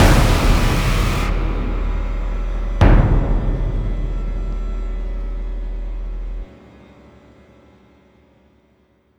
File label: Ambient Sting Variant; Prototype; xbox 360